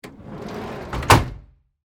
Tool Chest Drawer, Industry, Tool, Chest, Drawer, Close, Large, Metal; Hand Tool Sound Effects; BlastWave